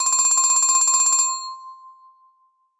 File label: sharp ring; ringtones; Google; Google Sounds; Play It Loud